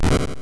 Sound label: Poof; MMBNMMOP